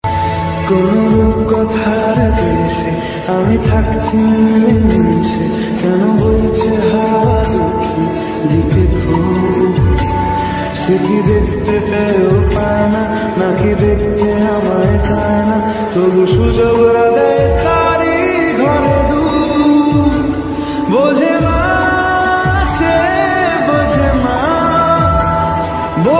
Bengali Ringtone

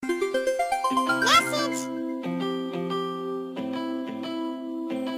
Cute Message Alert Tones; ringtones

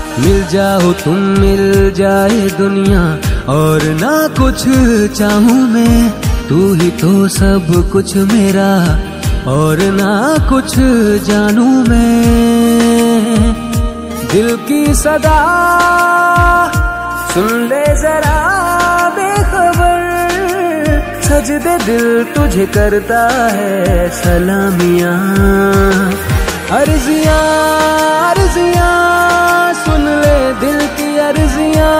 Hindi Song Ringtone

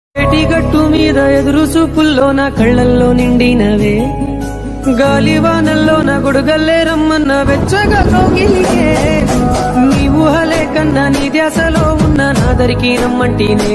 Telugu Ringtone

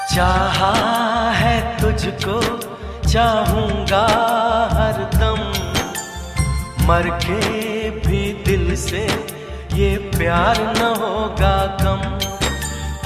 Hindi Sad Song Ringtone

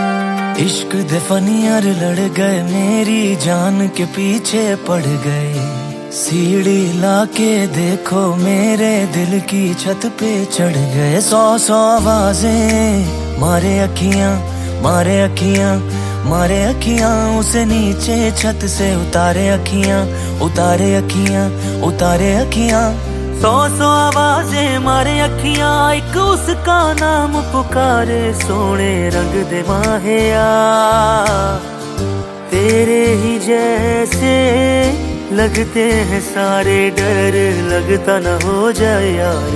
New Hindi Song Ringtone

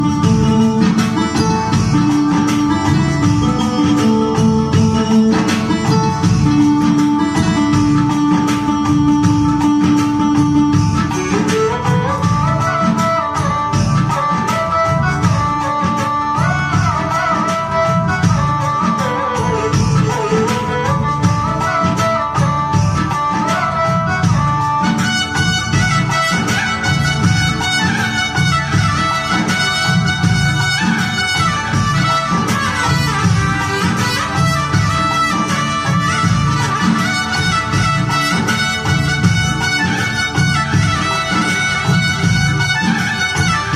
Instrumental

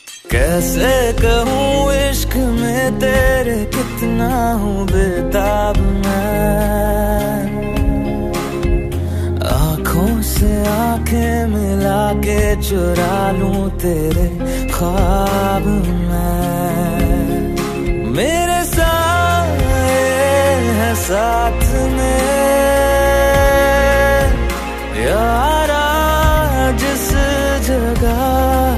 Romantic Song Ringtone

